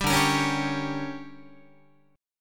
D Major 7th Suspended 4th Sharp 5th, Guitar; DM7sus4#5; strummed; JGuitar

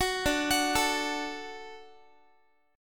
D Major D down Guitar; arpeggiated; tuning 4A,4E,5A,5D,5G#,6C#

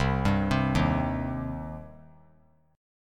C Minor C down; arpeggiated; JGuitar

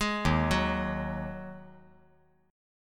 D sharp Minor Double Flat 5th; arpeggiated

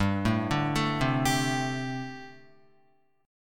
G Suspended 2nd Suspended 4th, Guitar; Gsus2sus4 arpeggiated; JGuitar

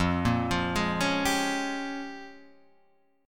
Bb Minor 13th F

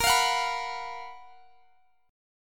F sharp Major 11th A sharp

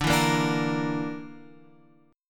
D Suspended 2nd Sharp 5th D

